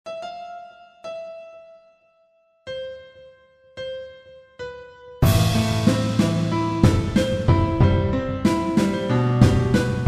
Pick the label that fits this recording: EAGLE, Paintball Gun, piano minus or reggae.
piano minus